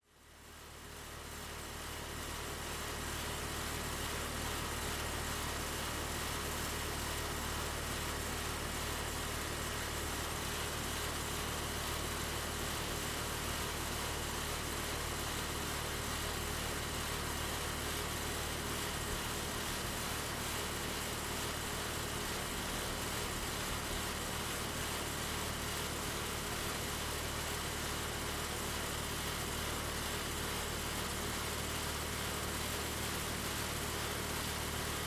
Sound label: industrial air conditioner; silknoise